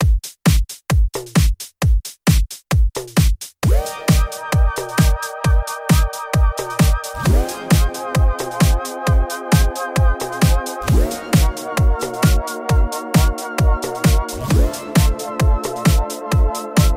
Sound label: Smooth Synth Love